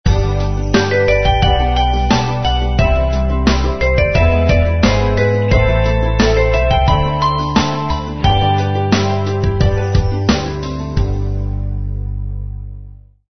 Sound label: Spring Bubbles; Sony Ericsson